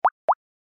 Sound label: bip bop